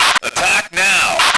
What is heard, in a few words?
radio; lmctf; andromeda